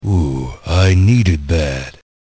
medkit

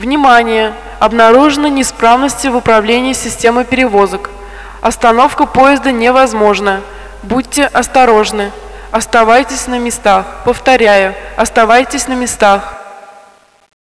tram

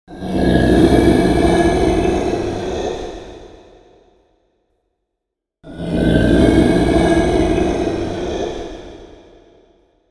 zombie idle

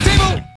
table

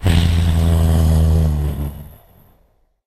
idle, zombie; justzombies

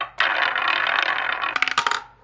roulette; crimecity

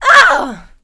pain, female; kingpin; blunt

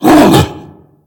zombie alert

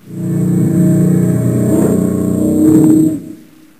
alien ship, sfx; dn3d r

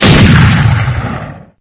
shotgun double; dn3d r

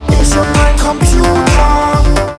computer

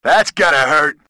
hurt

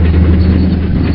tank; dn3d r

